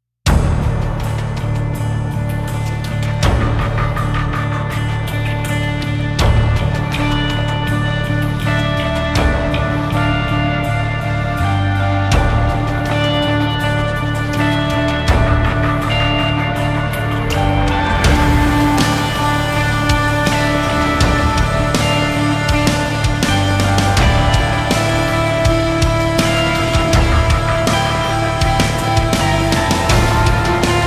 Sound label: Epic Speed Metal, No Choir; Gothic Storm Music